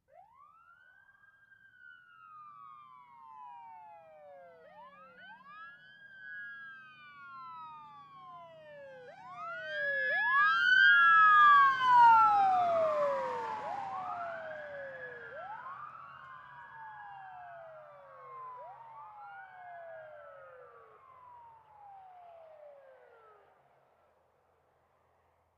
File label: Ambulance By Medium, ambulance